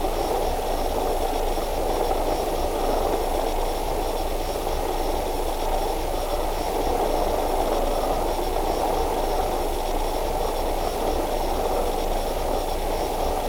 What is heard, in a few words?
Skateboard double